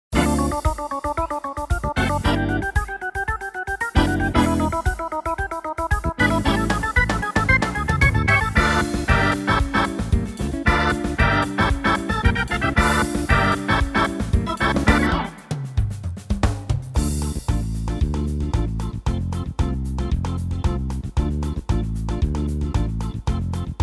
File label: Organ Samba